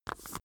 paper pull

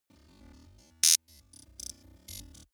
glitch rhythmic

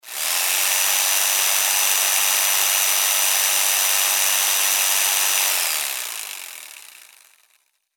electric jig saw

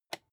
rotary control single turn

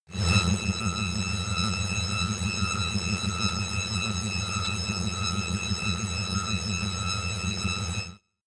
attune orchestra

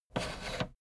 fader plastic scrape single down halfway slow

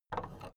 big plastic case handle take

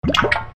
liquid event

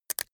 crack knuckles

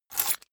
key ring large grab table

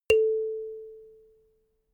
notification kalimba single

